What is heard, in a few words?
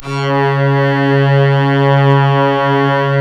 C#, strings; Big Fish Audio